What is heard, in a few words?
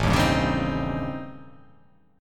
A 7th Sharp 9th Flat 5th